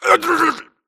grunt electro; Titanfall 2

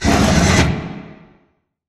sliding doors open slide; beacon; Titanfall 2

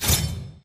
Notification Right Slide In; HUD; Titanfall 2

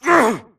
grunt hits; Titanfall 2

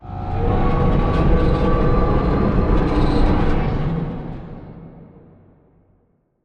Giant Pillar Distant Rotate; Titanfall 2; Boomtown

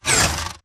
Mvmt Servo Light Short

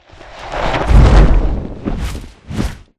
flyer picking up soldier dive med dist; boneyard; Titanfall 2

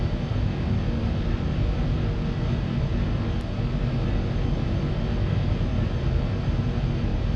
Prop Warehouse Prop Rack Move Dist; Titanfall 2; Boomtown

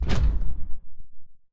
atlas footstep jog; Titanfall 2

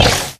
imp bullet lightballistic shieldwall; Titanfall 2

